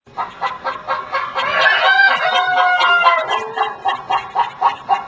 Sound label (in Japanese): niwatori; 着信音やアラーム（目覚まし）音に使える、着モーションとして; FOMA